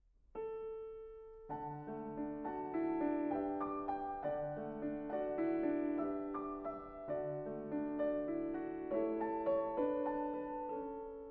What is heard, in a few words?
Piano Music